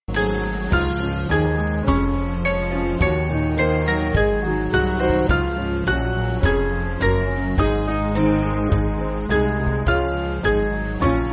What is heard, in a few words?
Band, Free Music Backing Files for Churches; Father I Know; Lebanon; 5/Db-D